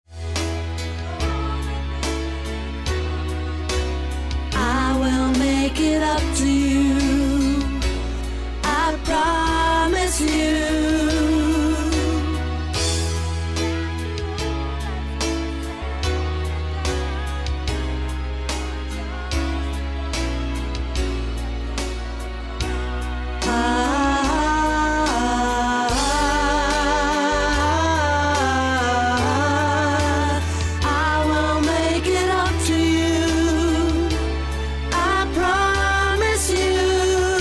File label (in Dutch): stemtest